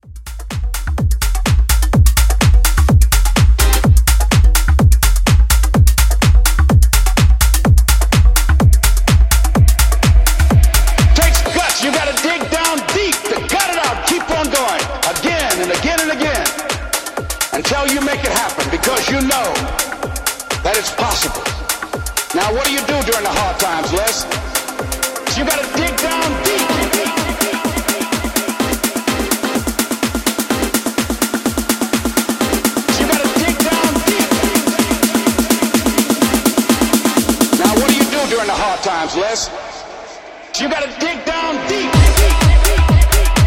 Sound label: remix